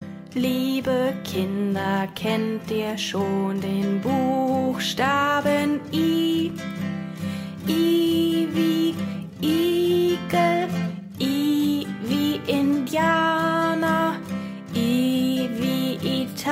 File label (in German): ABC song fur Kleinkinder